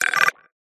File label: ui computer complex confirm